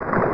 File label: water deep